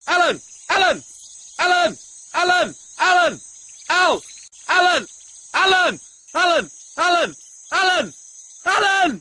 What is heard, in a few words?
funny squirrel, squirrel laughing